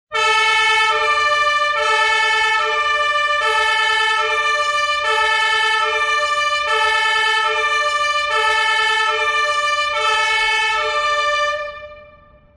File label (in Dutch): brandweer sirene ringtone